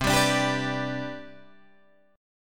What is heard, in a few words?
C Major 7th Suspended 2nd, C, down, Guitar, Open G